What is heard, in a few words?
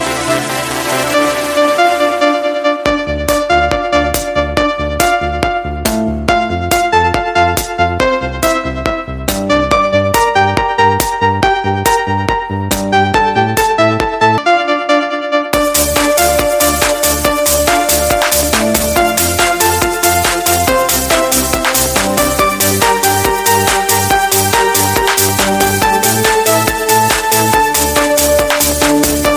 Changing instrument